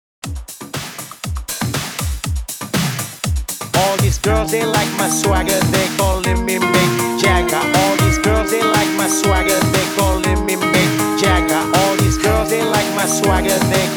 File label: HYPE REDRUM 120 BPM